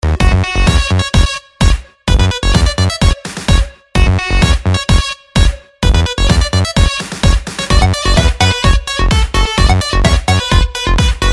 Turkish Techno

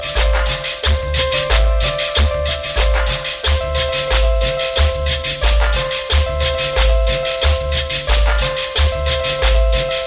Electro Cumbia

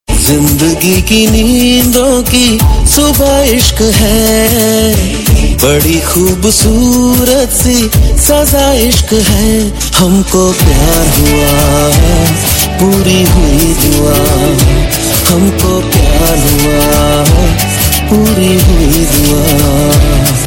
Male

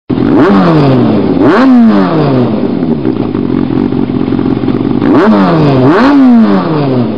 Sportbike

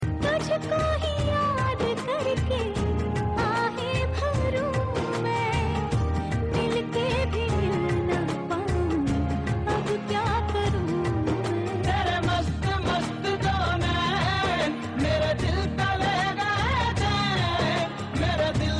Female; Dabang

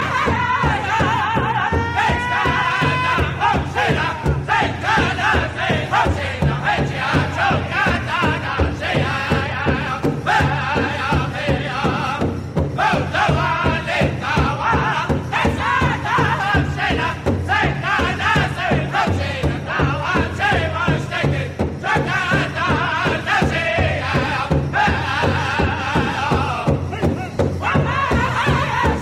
Northern Singing

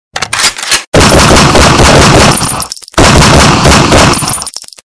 Gun N Bomb Sms

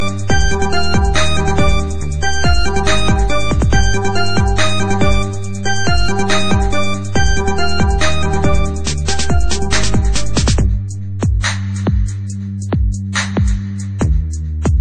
Nokia hip hop

